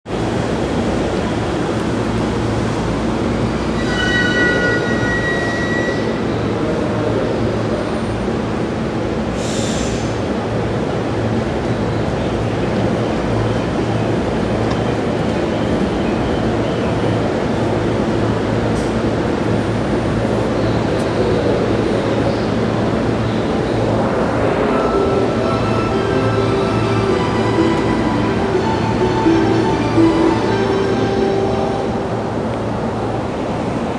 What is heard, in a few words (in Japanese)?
JR中央線快速三鷹西, train sounds